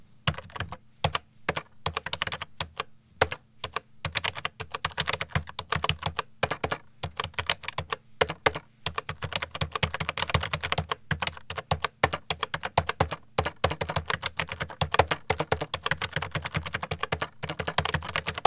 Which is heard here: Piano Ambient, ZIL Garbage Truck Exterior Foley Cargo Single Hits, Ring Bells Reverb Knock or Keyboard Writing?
Keyboard Writing